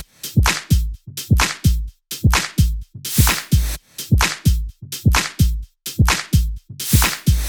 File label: 128BPM Dogde Kit